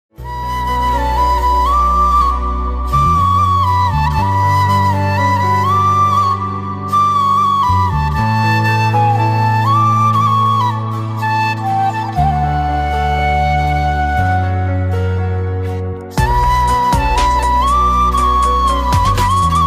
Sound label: Flute Ringtone